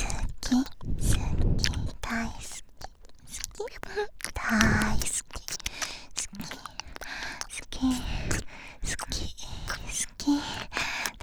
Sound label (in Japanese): 右耳舐め, ASMR